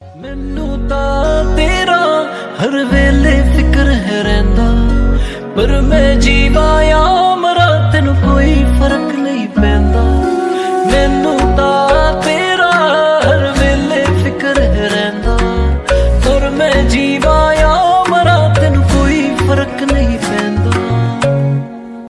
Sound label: bollywood ringtone